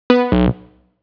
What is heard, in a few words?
action Reject